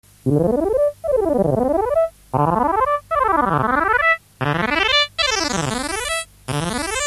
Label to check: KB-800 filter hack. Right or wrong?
Right